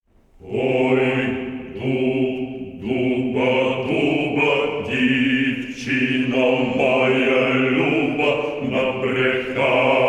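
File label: Male choir of Saint Petersburg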